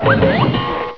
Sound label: Cartoon Sound; PC; MARIOSFUNDAMENTALS